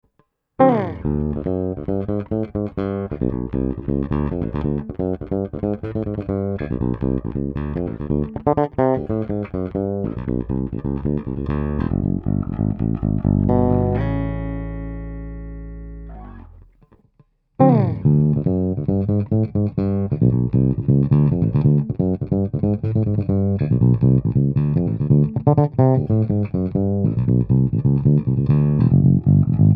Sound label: J Bass Fingered Funk Line Speaker